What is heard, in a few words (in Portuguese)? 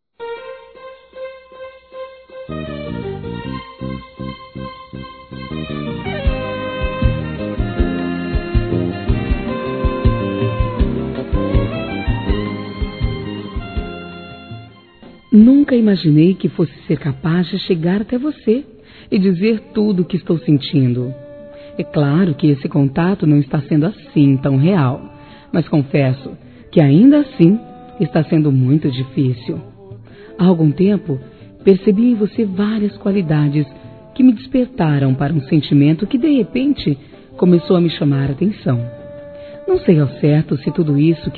PAQUERA F, Conquistas Cantadas; Conquistas Cantadas paqueras; fem; Telemensagens; Telemensagens 2017